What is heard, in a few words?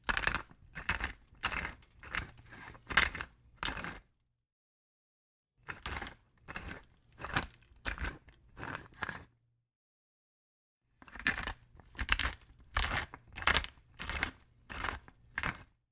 Household Peppermill